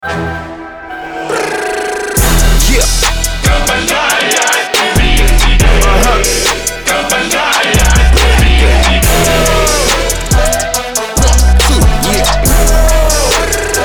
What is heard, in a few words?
hiphop